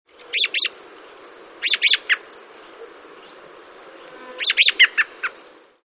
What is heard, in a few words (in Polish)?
drozd wędrowny - nawoływanie; Turdus migratorius; wroblowe; turdidae; ptak do Polski zalatujący